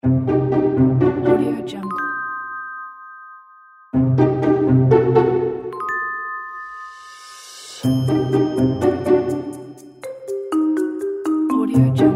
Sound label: sneaky